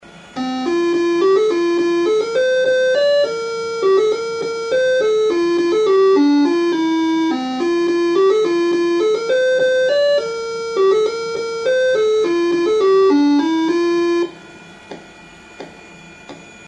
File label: away in a manger